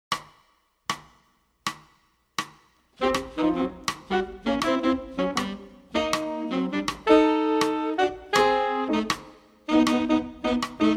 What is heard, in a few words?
Metronome